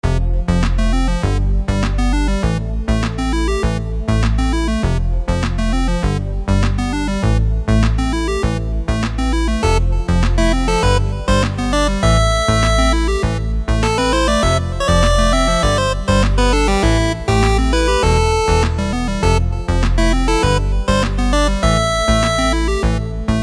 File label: menu intro 100 BPM, action game music; アクションゲーム; Action Game Music Pack